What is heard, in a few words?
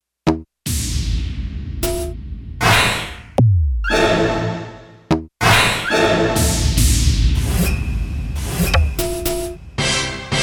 FX Menu